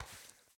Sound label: Hand Put; Game rip; MiSide